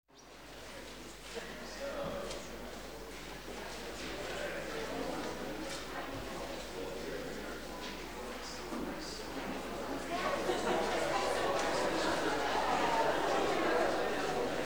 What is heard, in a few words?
Audience Leaving Hall; KMP Film Video Sound Effects